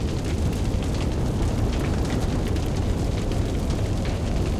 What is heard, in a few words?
fire Particle; elements